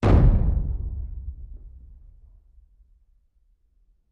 30 Feet Primacord

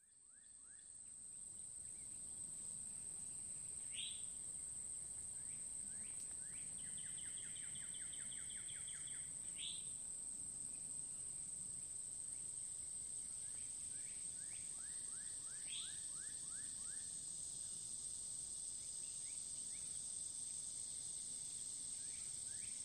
Forest Amb, crickets

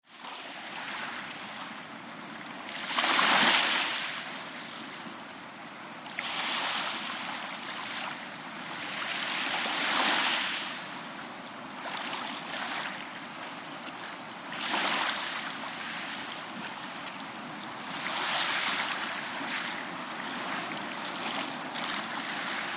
Lht Lapping Waves CU, waves & surf

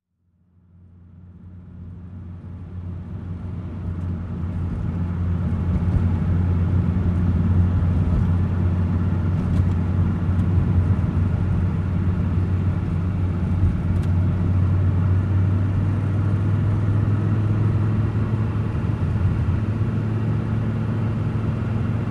Driving At 25 35 Mph, sports car

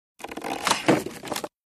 Ice Break Lht Stress B, breaks exterior

